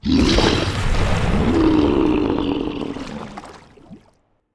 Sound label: kraken death, monster